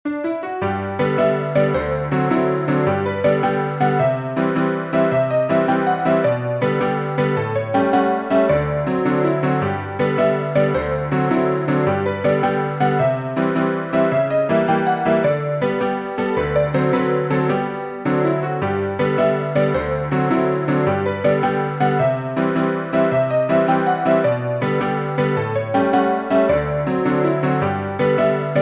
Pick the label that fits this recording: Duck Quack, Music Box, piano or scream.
piano